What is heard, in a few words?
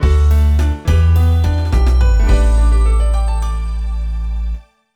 Jig; eigo de tabisuru little charo